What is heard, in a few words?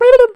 Buluboop A Weird Mouth Sound